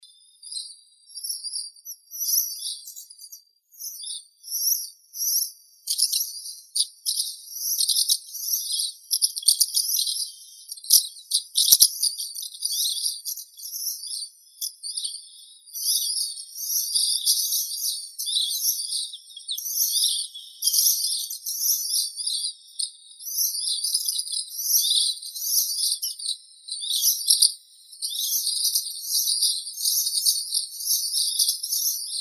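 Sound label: house martin, Delichon urbica; lastochka gorodskaya; voronok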